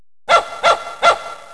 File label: DOG, 狗; 动物; 家畜; 特酷声音素材库